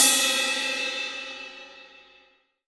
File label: crash, dry; 18-22 CRASH